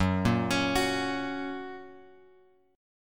F sharp Major, guitar; F# Chord {2 1 X X 2 2} arpeggiated

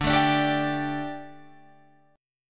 D Suspended 4th D, Guitar, Drop D

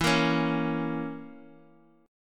Bb Diminished E

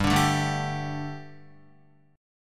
G Suspended 2nd G down Guitar; strummed

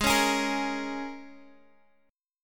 A sharp Minor 7th

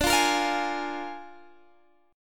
Bb 7th D, chord

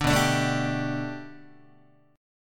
B-Minor 7th Sharp 5th B down Guitar; Bm7#5; strummed; JGuitar

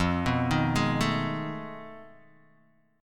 F 7th Sharp 9th Flat 5th, Guitar, DADGAD

